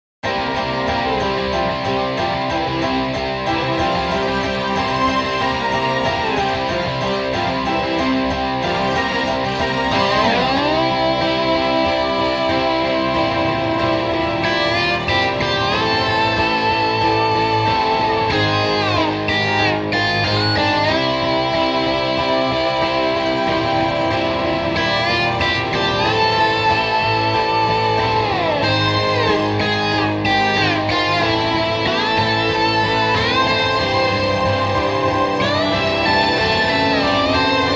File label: Slide Guitar; MidiGuitar